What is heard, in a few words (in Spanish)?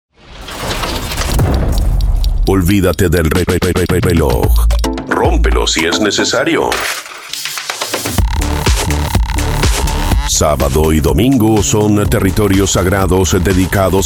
Arte Ambient Otono